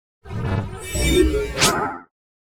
Magic

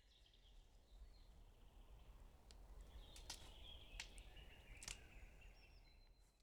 forest motion, Night; FontainebleauDemo

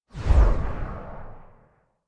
Fly By beefed low; Megacity

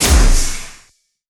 jump pad; ML-Agents; FPS Game

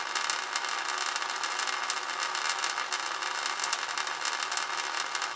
amb drip; room 01; ambient

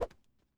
Jump Liftoff

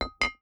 Pots

